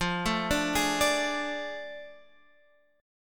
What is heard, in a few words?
A Major 7th Suspended 4th Sharp 5th